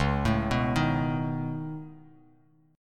C sharp 7th Suspended 2nd Sharp 5th, C sharp, down, Bass Standard; arpeggiated; chord